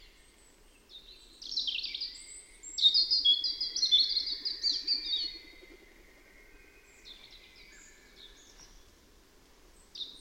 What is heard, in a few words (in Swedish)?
rodhake Erithacus rubecula